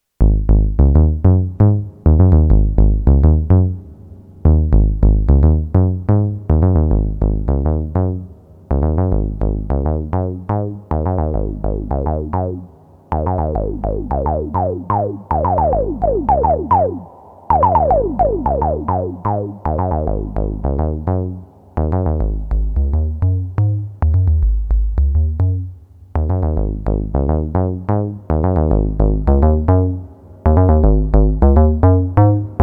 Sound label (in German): Bass mit Resonanz verschiedene Wellenformen